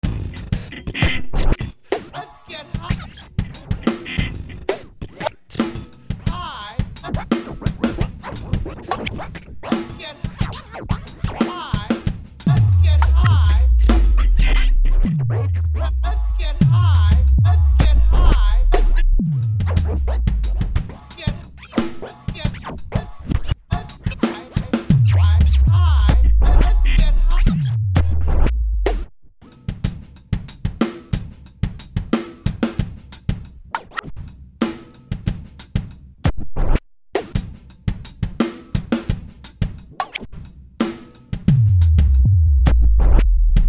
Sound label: Scratch it, Drum and Noise